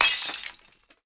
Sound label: jewel break; sfx